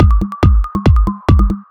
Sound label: Creeper